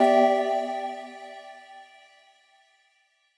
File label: Clear Em1; Mixolumia